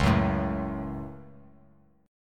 Db Suspended 4th Sharp 5th; x,4,4,2; strummed

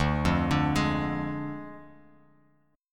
D Diminished D, Bass Standard; arpeggiated

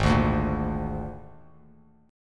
G sharp Suspended 2nd Flat 5th, Bass Standard; G#sus2b5; strummed; JGuitar

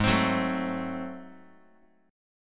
G sharp 7th Flat 9th G sharp down Guitar, chord; G#7b9; strummed; JGuitar

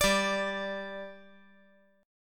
G 5th G, chord

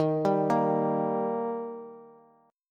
Eb Major Eb down Bass; arpeggiated; JGuitar

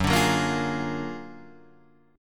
Gb Augmented Major 9th, down, Guitar, Open D

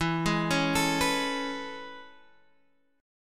A Major 9th E, chord

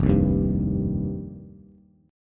F 7th Suspended 2nd, Bass; F7sus2; strummed; JGuitar

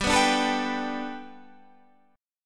G Major Add 9th, Guitar, Standard; 15,14,12,14,12,15; Gadd9 strummed

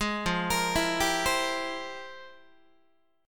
F Minor Major 11th Guitar, chord; arpeggiated; JGuitar

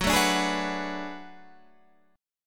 B Minor 13th F sharp

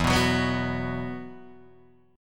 E Suspended 2nd E, Guitar, DADGAD

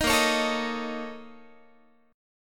Bb Minor Major 7th Double Flat 5th, Guitar; BbmM7bb5 strummed

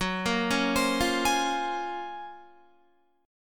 F sharp Major Sharp 11th F sharp, Guitar; F#M#11 arpeggiated; 13,13,13,17,17,16; 4F,4A,5C,5G,5C,6E; chord shape

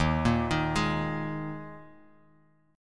D sharp Suspended 4th D sharp, Bass Standard; arpeggiated; chord; 11,13,13,13; JGuitar

